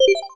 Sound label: Call Connect; sgh-d720; Digital